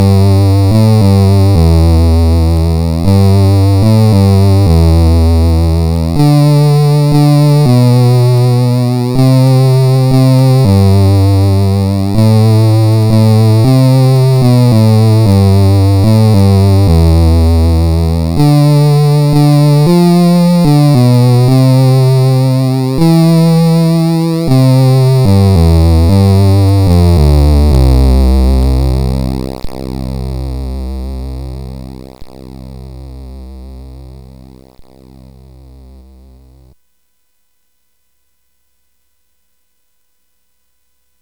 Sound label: Xmas Song, sid CSG8580R5; Maniac; Xmas Song 1; hvsc